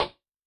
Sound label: Fact rim, Yamaha DTXpress-II snare